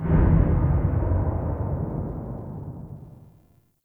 Big Hit; Warframe; Ambience; Nokko Colony

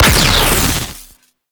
Burst Laser Shot; Warframe; Teralyst; Ropalolyst; Abilities

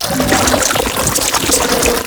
Tentacle Flail Loop; Warframe; Abilities

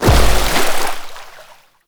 Venus Hoverboard Land Water, Vehicles; Warframe

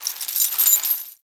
Chain Fall, Dojo; Ambience; Warframe; Mod Quest; Teshin's Hideout

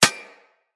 Bullet Impact Generic Metal; Weapons; Surface

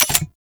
Heavy Grenade Launcher Mech; Warframe; Archwing

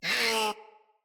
Vocal Pain; Warframes; Khora

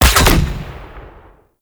Grenade Shot; Warframe; Lasrian Soldiers; Weapons; Lasrian Chem Nox

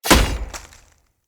Great Sword Melee Impact Wood; Weapon Impacts; Founders Great Sword; Environment

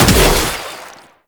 Shot, Infested Arm Cannon, Weapons; Warframe; Coda Bubonico

